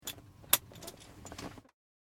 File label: Helicopter Latch Seat Belt, Seatbelt in a helicopter cockpit; being latched